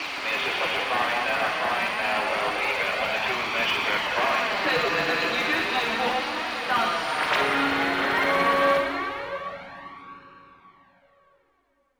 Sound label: AMBNT 160; Ambient